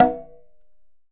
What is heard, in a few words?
Conga Slap